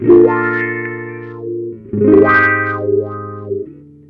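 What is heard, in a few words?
wah funk; Jazzistic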